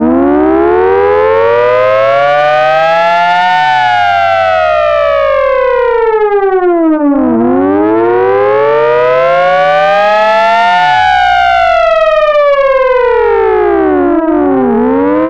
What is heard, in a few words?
civil defense alert siren